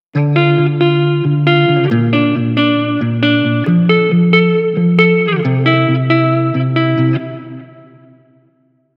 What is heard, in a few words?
Guitar After